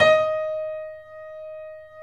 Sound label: 3D Pianos Yamaha C7; E-MU Producer Series Vol. 5